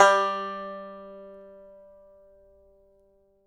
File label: banjo G3, banjo notes, country; Sampleheads; Whole Lotta Country 1 & 2